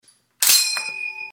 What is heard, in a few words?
ping